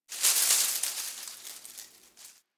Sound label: Derelict Tree Shake; Warframe; Orokin Derelict